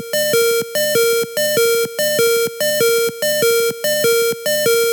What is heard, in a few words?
Health Low Loop; Pokemon; Gen 3; attack moves; RSE